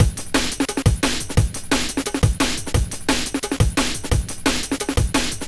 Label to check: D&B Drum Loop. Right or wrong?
Right